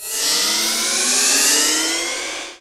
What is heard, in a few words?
rocket